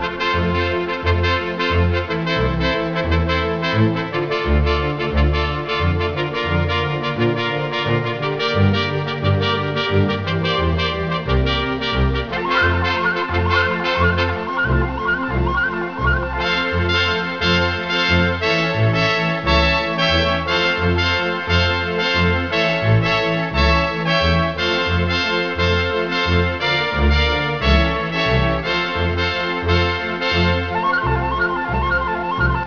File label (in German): hymne